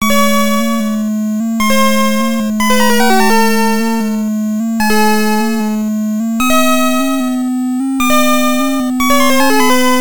BGM; Ghosts 'N Goblins; NES

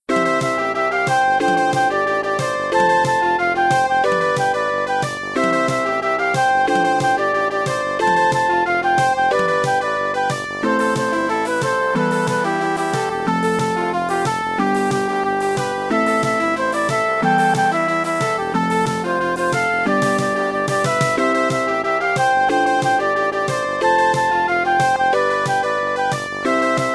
Victory; Nicky Boom; DOS

